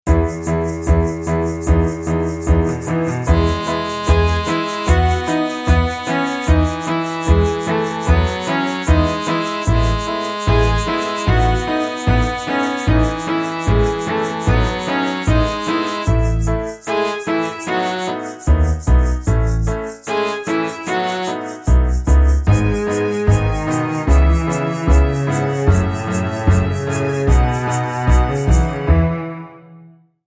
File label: STOMP BOX